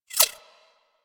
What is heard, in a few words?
Sentient Projectile By; Warframe; Sentients; Symbilyst; Weapons